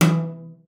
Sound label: E3, CELLO FINGER; Xsample VOL-5